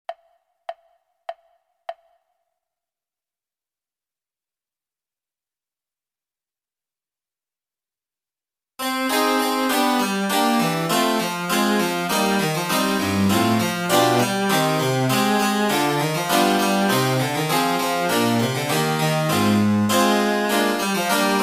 oboe sonata; handel; hwv366

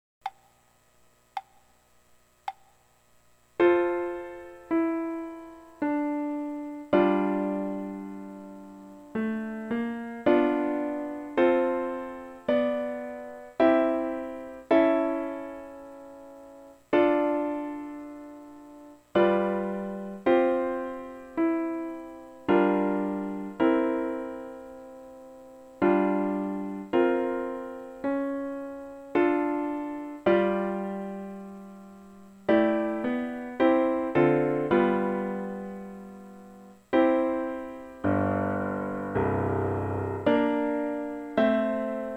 cavalleria rusticana piano; mascagni

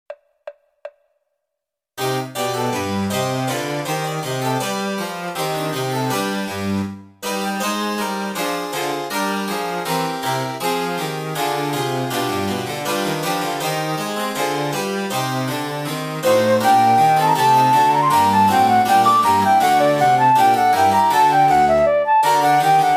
bach trio sonata

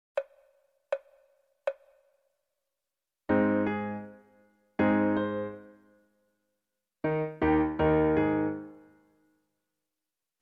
clarinet sonata; saint-saens

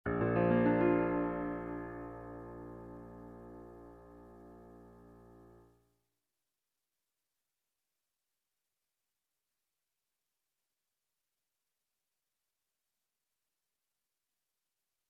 oboe sonata; saint-saens

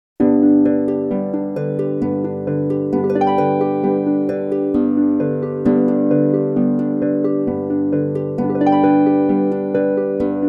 le cygne harp

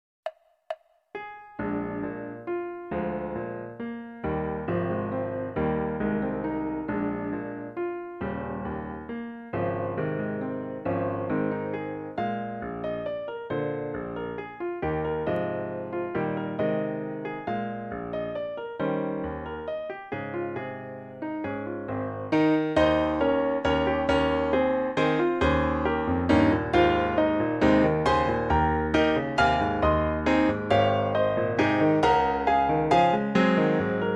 clarinet sonata; brahms

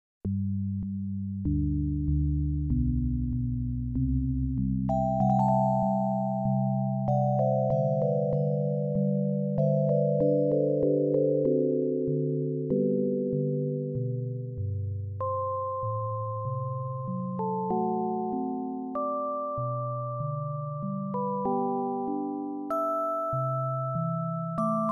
bell; mozart fl konzert

